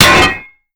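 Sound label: metal hydrant impact; mtl hydrant impact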